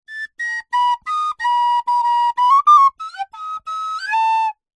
musical instrument recorder playing badly out of tune short